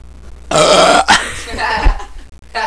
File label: burp